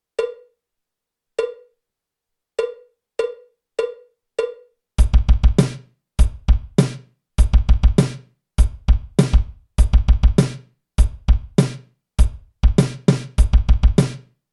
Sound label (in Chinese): 架子鼓独奏 硬摇滚; 66条架子鼓独奏SOLO